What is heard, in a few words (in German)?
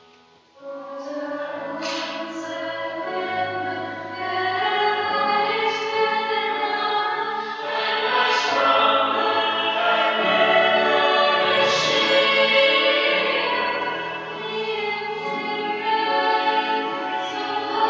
Vater unser latin Jazz Mass